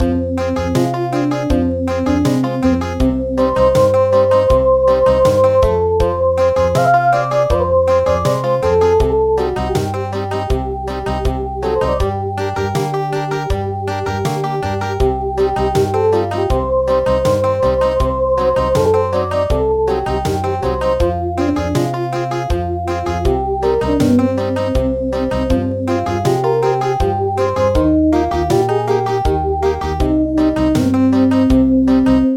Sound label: Country, midi traditional